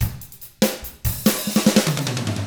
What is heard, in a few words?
ROCK 6-8, Drums; ILIO; Double Platinum Drums 1